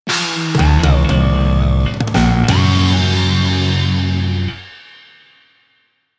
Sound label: Battle Lose Jingle; SPLATOON ORIGINAL SOUND TRACK Splatune CD 1; Nintendo